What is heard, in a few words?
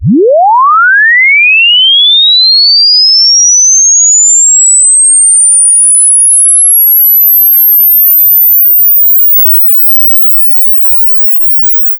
Sweep 48k 24bit -1dB